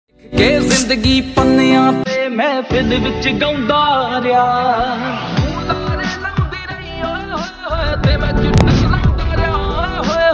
Dance Punjabi Ringtone

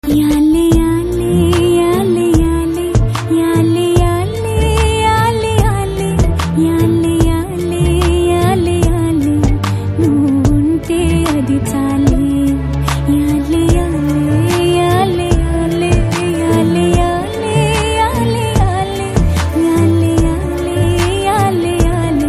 Telugu Ringtone

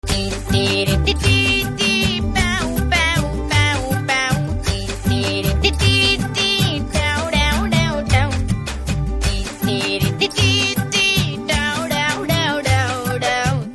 BG Telugu Ringtone

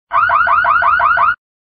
Car Theft Ringtone

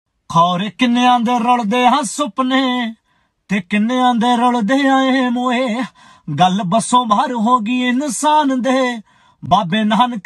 Dance Punjabi Ringtone

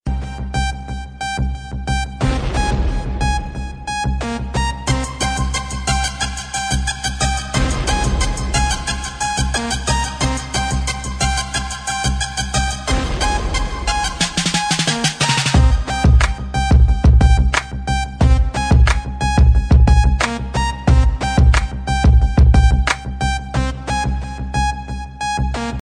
Awesome Instrumental Ringtone

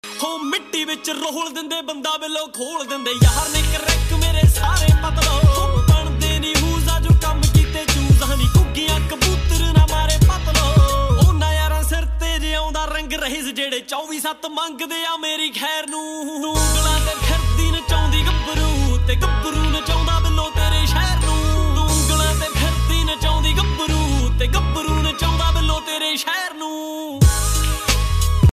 Punjabi Beats Ringtone

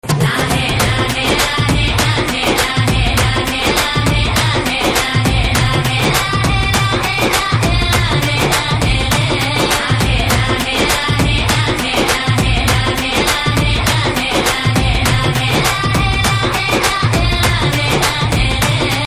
Theme Telugu Ringtone; Acharya

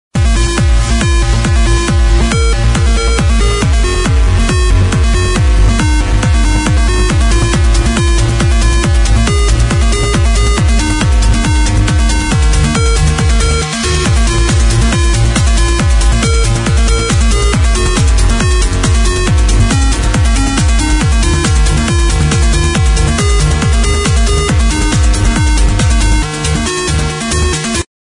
High Rhythm Ringtone

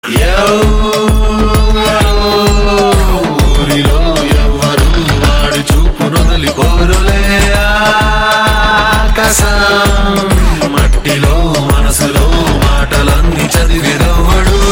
Bgm Ringtone; Uppena

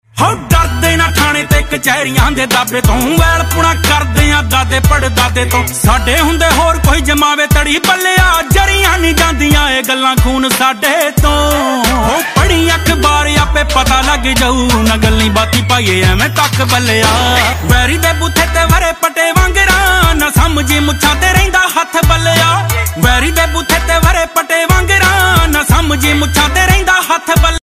Punjabi Ringtone

